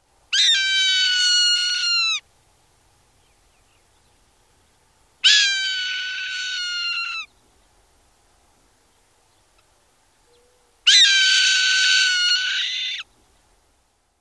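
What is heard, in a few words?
calls, Swainson's Hawk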